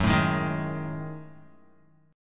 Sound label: F sharp Minor 6th Add 9th, Guitar; F#m6add9 strummed